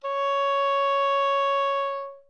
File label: oboe